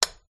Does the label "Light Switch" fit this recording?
Yes